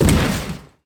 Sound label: Bodyfall Hollow Metal; SFX Kit; Bodyfalls